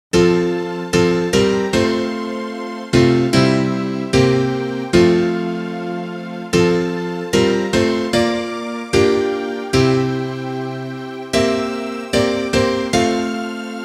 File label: instrumental